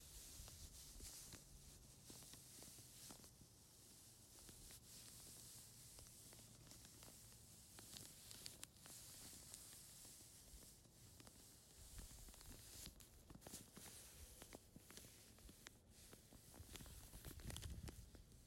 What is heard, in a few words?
rub